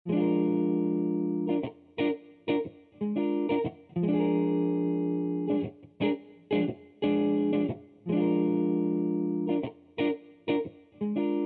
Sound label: solo